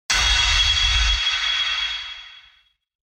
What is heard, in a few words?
UI comform sound